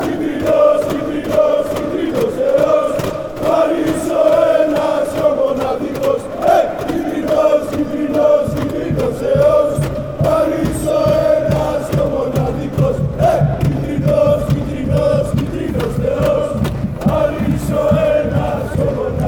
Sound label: chant, fanchants